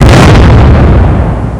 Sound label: bang; quake2